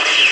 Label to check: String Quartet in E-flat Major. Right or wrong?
Wrong